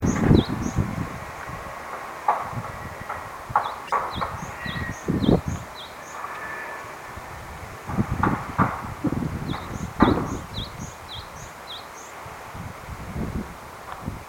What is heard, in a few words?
attracting a pair